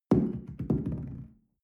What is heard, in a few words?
shell place on tablecloth wobble medium; soundpool